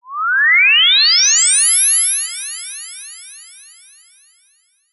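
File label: cartoon sci fi rise laser whistle delayed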